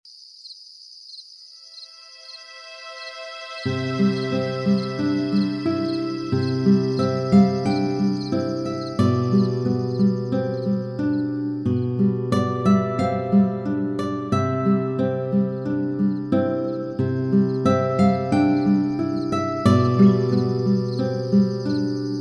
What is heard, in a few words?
Menu Guitar; One Tap Hero; PS Vita